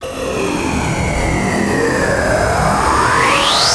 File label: VEERING, Trance; ILIO; Trance Fusion; STOP LONG